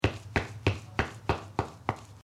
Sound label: block tap